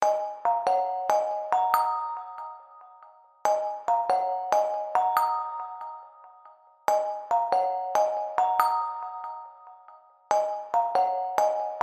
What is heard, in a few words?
jump Nokia ringtone